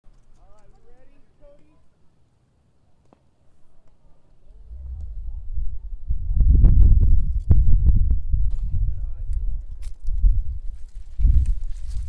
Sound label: Baseball